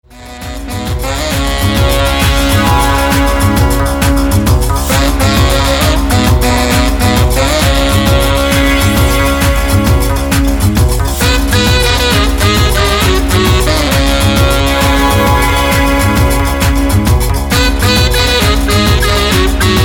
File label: Urban Gnawa